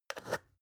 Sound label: spice jar scratch close short